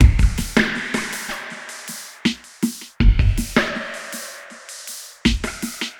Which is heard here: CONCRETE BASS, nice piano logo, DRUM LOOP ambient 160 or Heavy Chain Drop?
DRUM LOOP ambient 160